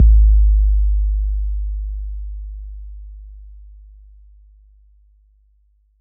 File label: BD 808 Decay